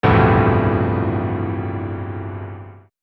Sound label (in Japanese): ガーン